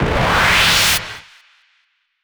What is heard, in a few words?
Sweeps Up, Effects